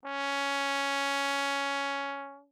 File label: Tenor Trombone ff Db4; Brass